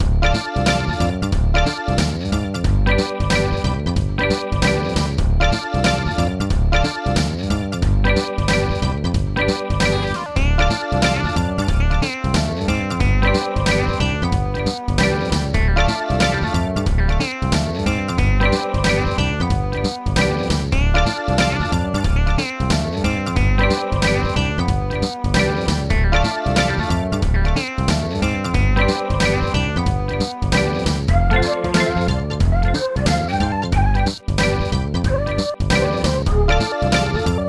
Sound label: Level1 Music; MGOT Demo